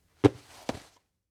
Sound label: hat take off